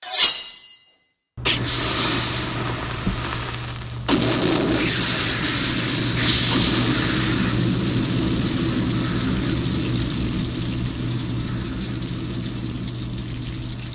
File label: Flaming Sword On